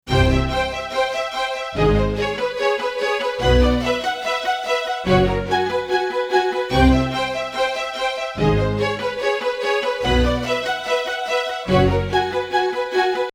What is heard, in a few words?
strings; persian